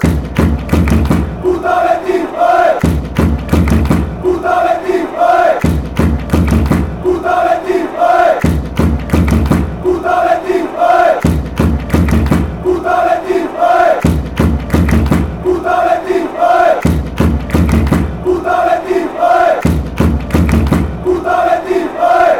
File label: betis, fan chants